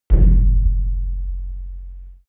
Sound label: low rumble impact, percussion